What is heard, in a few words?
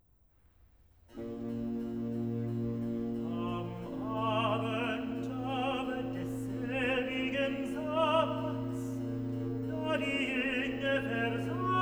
Cantata, Recitativo (Tenor); BWV 42 'Am Abend aber desselbigen Sabbats'; Bach; Arnstadt; 1st Sun After Easter